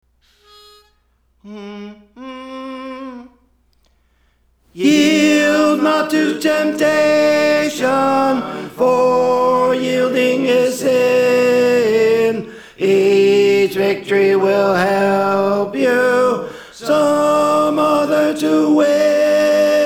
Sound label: Tenor; Praise For The Lord Training